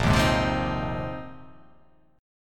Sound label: Bb 9th Suspended 4th Bb down, Bb9sus4 chord; strummed; JGuitar